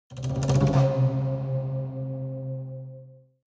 haunted strings roll rattle

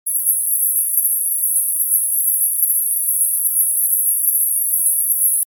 high frequency cut

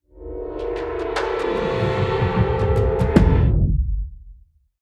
sinister

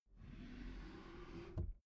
desk drawer open soft

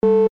control signals

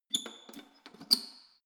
letterbox metal close lock key out soft

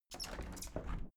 window plastic squeak open regular sequence

